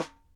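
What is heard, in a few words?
snare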